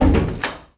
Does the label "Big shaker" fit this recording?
No